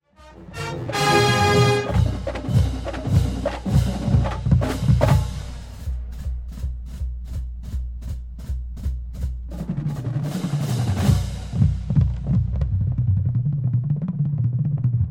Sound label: Drum Feature, marching band